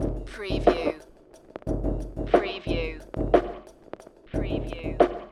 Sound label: Hip Hop Drum Loop 90 BPM - Straight Lo-Fi